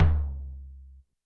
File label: Tom Lo-Fi, YAMAHA RM1X